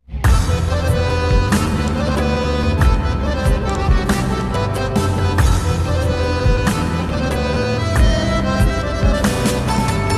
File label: instrumental ringtone, pakistani ringtone